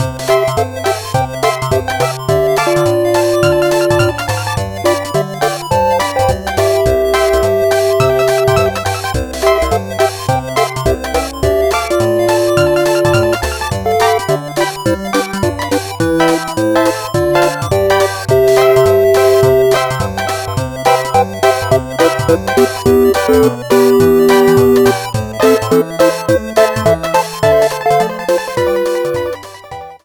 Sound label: String Skip Saloon Menu; Rocksmith 2014 Edition Remastered